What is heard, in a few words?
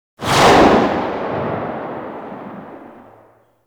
Revolver Rifle Tail; Warframe; Tenno